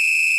soft slider whistle; Karthy v6.0

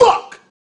combo break; Aleph DT

